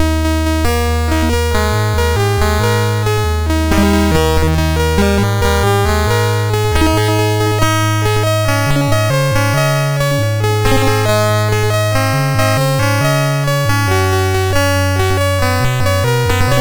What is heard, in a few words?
Randomly generated music BASIC, sid MOS6581R2